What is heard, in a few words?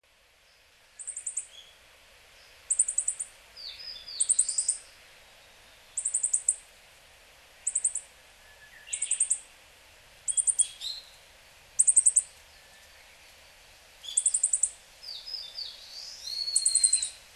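Asian stubtail, hyogo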